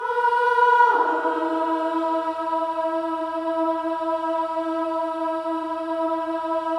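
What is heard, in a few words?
Voices, INTERVALS, 5TH DOWN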